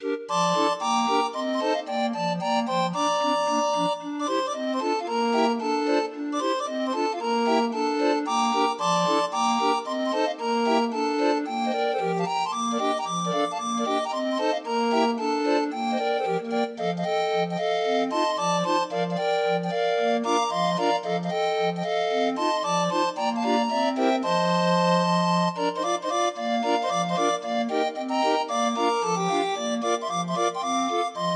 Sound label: Grind Organ